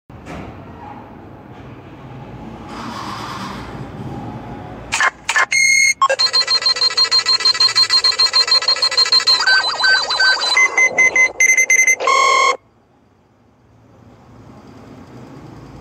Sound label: Lie detector